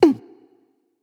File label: grunt hit; eset